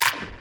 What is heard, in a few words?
death chomp; smite; chaac